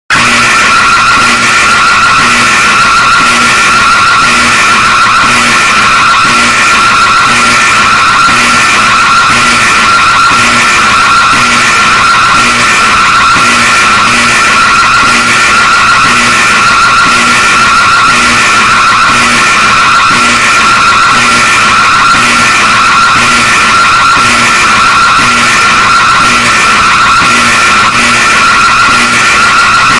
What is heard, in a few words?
church bells loud ringing